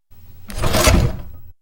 Paper Cutter

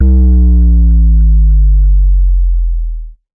Bass Drop Sound

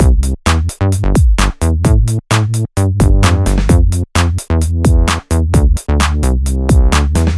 HipHop Bass Beat

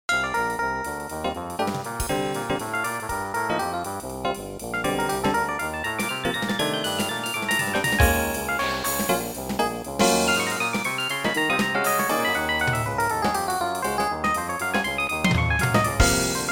Jazz Trio Midi

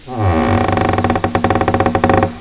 Door Creaking

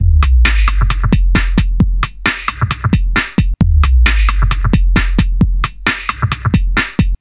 Nu Skool Break

